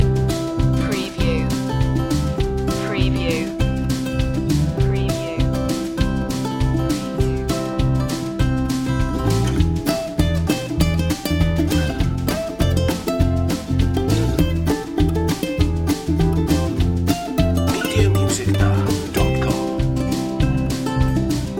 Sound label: happy tune loop